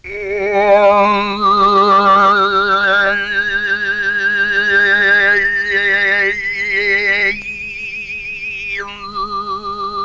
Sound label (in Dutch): tuvazang